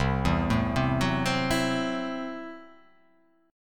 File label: C 6th Flat 5th C down